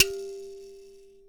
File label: G3 mbira; WorldSounds